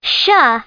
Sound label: shuh